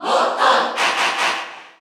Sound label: Cheer Korean; Morton; SSBU